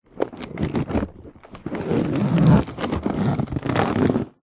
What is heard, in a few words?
foley balloon or inflated surgical glove handling movements; zapsplat